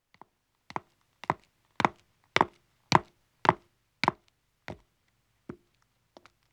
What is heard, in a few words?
Stone Stairs (Echo) Descending, Footsteps; BBC Sound Effects Library; Footsteps II